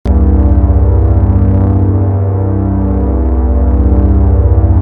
Bass Tritone OB Xa

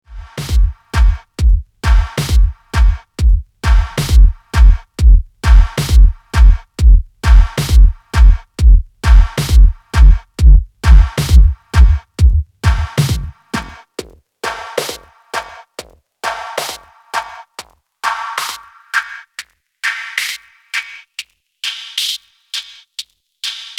Master HPF max. Resonance

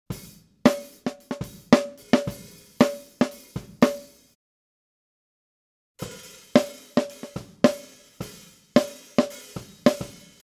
snare sm57 e905

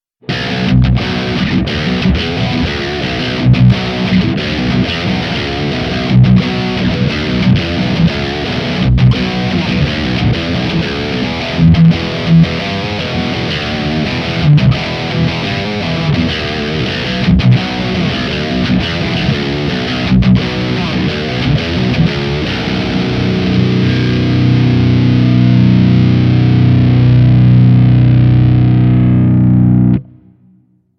Reverend Descent W Bridge Humbucker Highgain Riff

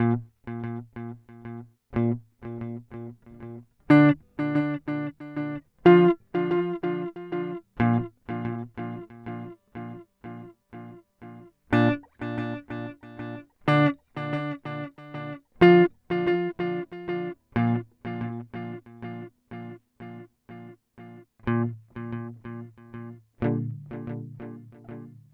Amplitube X-Time Dual

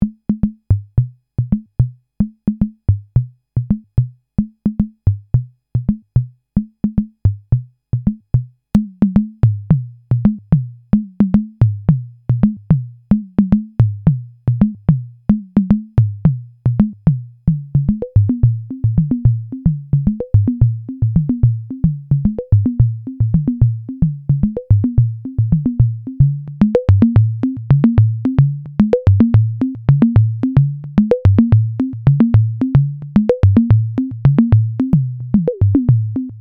Tom Conga

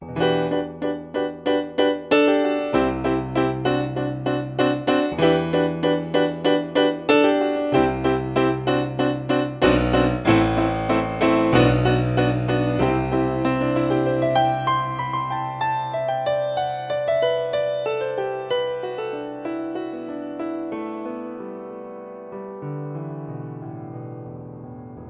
Bright

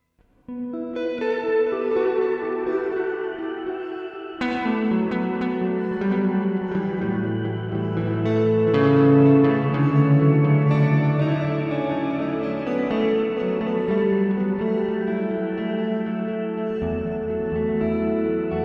serial mono verb; NUX duotime

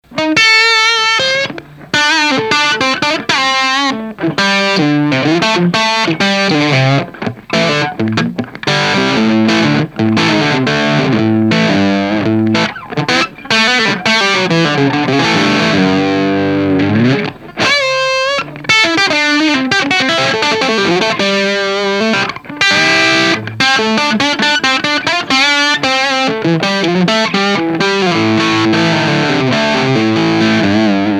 UAD OX Amp Top Box

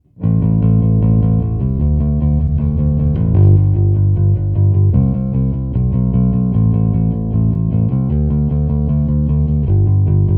rock pick

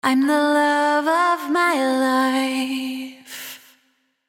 Vocals Stereo Lead Vocal

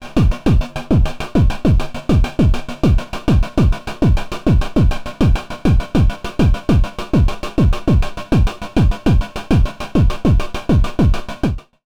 Loops And Drones

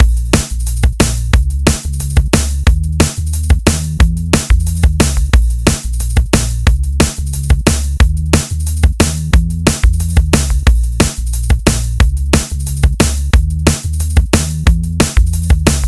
DnB Interlude; Work Shop

